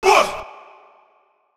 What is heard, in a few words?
Chant